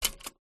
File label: office typewriter number single key press rear close vintage 1960 Lemair Helvetia